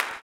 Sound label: CLAP, Blues Soul; Spectrasonics Vocal Planet